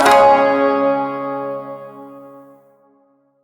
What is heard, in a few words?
Shawzin Chromatic Chord; Warframe